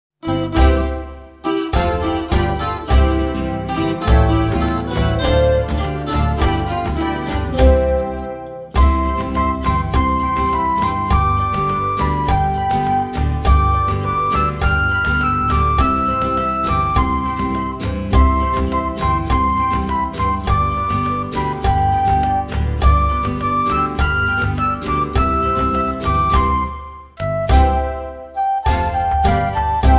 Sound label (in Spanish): Campanas de Belen musica; villancicos